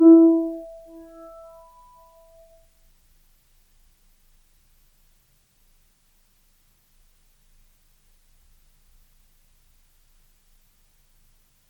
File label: Grand Piano, Kawai, Sustains, E3; Chordophones; Legacy